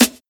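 crunchy snare drum b key, acoustic snare tuned to the B note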